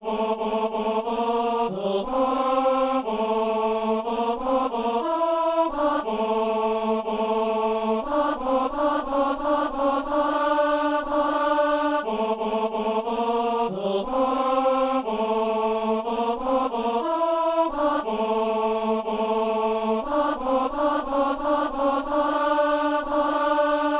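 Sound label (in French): messe simple gloria refrain ténor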